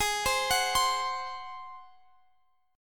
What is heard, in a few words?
G sharp 7th G sharp, Guitar Drop D